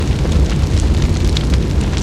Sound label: beat flame burning